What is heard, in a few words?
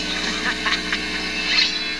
cackle